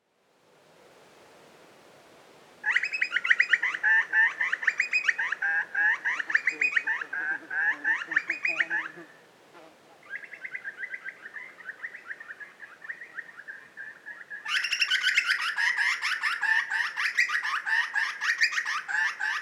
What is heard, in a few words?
Grey Butcherbird Cracticus torquatus